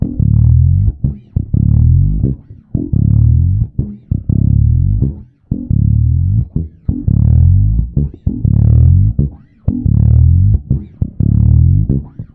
SLITHER, bass FX loops; Spectrasonics; Bass Legends

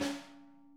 snare edge; Sonic Reality; Interactive Drum Kits

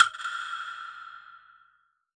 percussion special

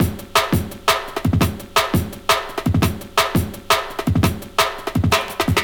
Hammer Break, Drum & Bass, Beats & Breaks

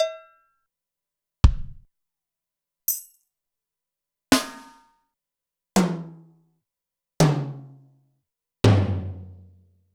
Zoom MRT-3B Kit; Sliced

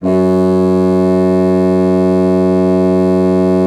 BASSOON F#2; Xsample VOL-6; C BASSOON

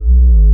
BASS DRONE, Synth FX Misc

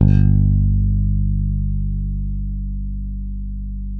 bass, sustain; Sampleheads; Will Lee Bass Library

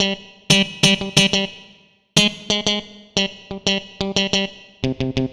Funky Guitar, Guitar Synths; Dr. Fink's Funk Factory

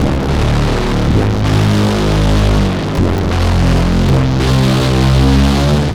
Dark Bass, Drum N Bass; Sonic Foundry (Sony Creative Software); Knutrix Drum & Bass

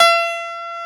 Piano Db3, mt-32

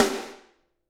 SD, Drums; Bob Clearmountain Drums I

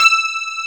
E.PIA E4; AKAI S6000 CD-ROM - Volume 1; Piano; PIANOS

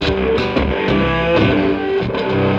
Chk Shk Curl, Electric Guitar; Sonic Foundry (Sony Creative Software) - Friendly Fire

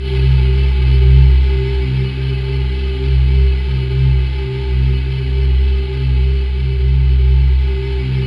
C2, Synth Leads

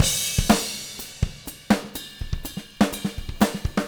124 CRSH, Living Drums

